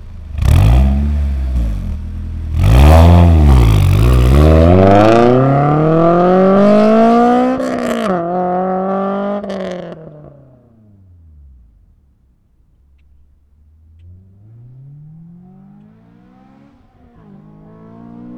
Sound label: accel 6k, s2000; s2000 modifications parts